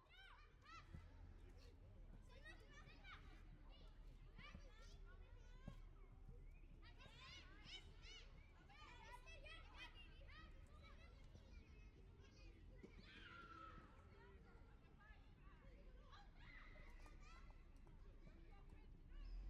Sports ground, Children athletics and soccer training, Distant city; traffic; Pole Position Production; Ambiences for Atmos Vol. 1 – Cities